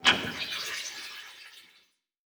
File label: Transition Hit Impact Electronic; Adobe; Production Element